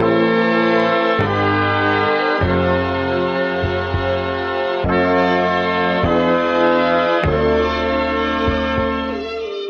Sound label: horns, phonky loops